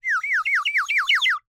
Bird whistle, clay, painted, water, airy, wispy, phrase, smooth, rising, inquisitive, 7 chirps; Eiravaein Works; Vaeyan IV